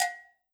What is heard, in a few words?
Cowbell Mid Stick Dry Session; One Shots; Drums; Ableton Live 12 Lite; Core Library